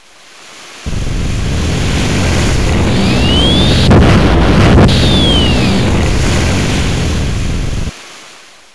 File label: ship takeoff; planet3c